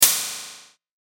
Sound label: hi-hat